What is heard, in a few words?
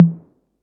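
808 Tom MID; Concrete Collage Drum Kit; Concrete-808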